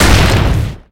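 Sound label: hit weapon rock ground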